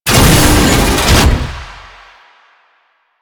Tank Turn Half, Movement; Warframe; Lasrian Tank

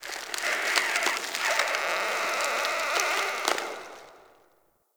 Root Cave Root Creak I; Ambience; One Offs; Neathuns Root Cave

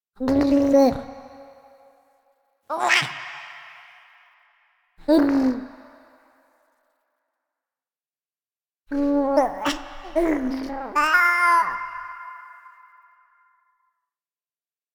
Baby Happy Loop; Warframe; Quests; Jade Shadows

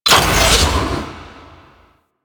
Switch Medium Start; Ambience; Orokin Moon; Warframe

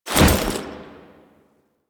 Foley Jump; Warframe; Kahl

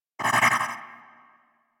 Ball Warning Pulse; Warframe; Missions; Tenno Ball

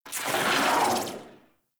shock rifle tail; Warframe; Weapons; Corpus

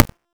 Chain Saw Tonfa Stack Buff

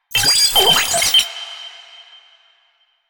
Sniper Drone Vocal; Enemies; Drones; Warframe; Lasrian

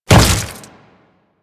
Step Flesh, Footsteps; Warframe; Grineer

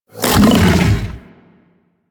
Predasite Vocal Attack Short; Warframe; Hunting Critters

